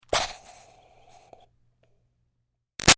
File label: Steam Release Iron Puff; Miscellaneous Household; BigRoomSound